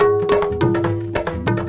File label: INDIA L; Spectrasonics - Heart of Asia CD2